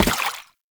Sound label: Step Water, Foley, Footsteps; Enemies; Warframe; Lasrian Soldiers; Lasrian Butcher